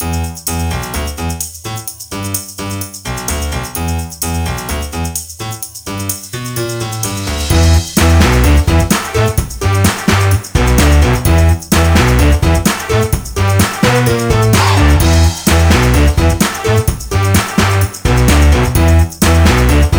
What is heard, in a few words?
boogie rock